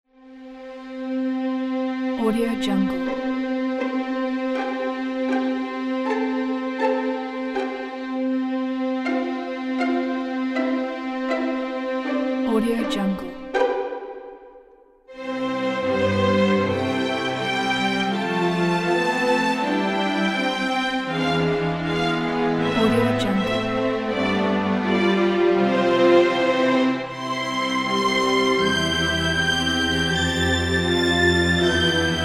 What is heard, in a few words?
twinkle twinkle lullaby; ciospe